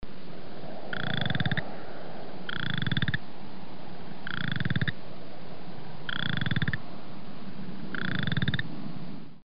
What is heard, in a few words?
pseudacris maculata boreal chorus frog